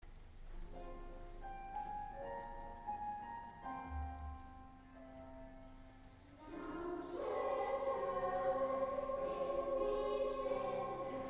Unterstufenchor Bajuschki Baju

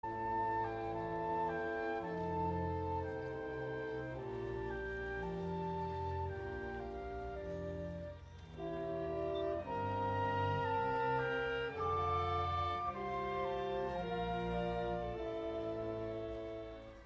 Unterstufenchor Wiegenlied

